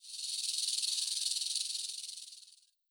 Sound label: Naga Snake